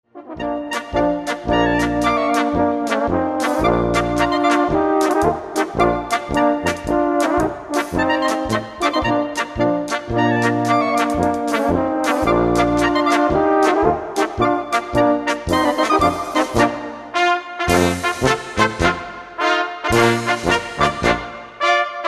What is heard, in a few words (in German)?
Tenorhorn